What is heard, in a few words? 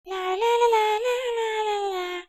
Lalala